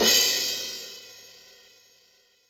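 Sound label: Deep Dark, Yamaha DTXpress Cymbal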